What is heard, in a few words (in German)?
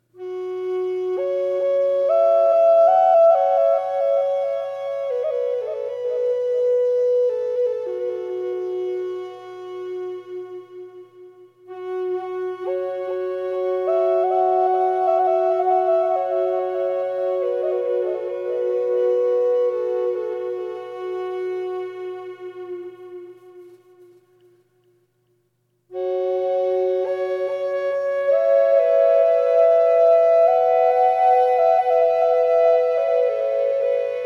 Triple Fis 440 mit delay, triple drone flute; nussbaum